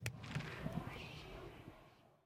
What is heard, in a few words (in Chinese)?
试炼刷怪笼环境声; ambient